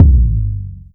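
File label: bass drum, right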